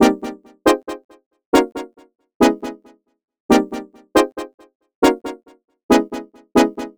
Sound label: House Vibe Stab; Rave Generator 3; 90’s Rave & Techno VST/AU/AAX/CLAP Plugin